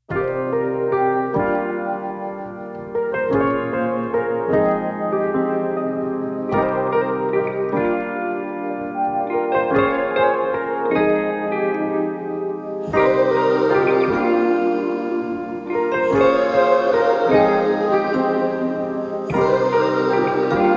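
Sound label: 150 bpm C minor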